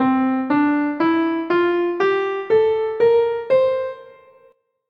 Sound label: C Myxolydian